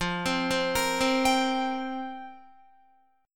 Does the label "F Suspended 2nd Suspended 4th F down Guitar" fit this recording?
Yes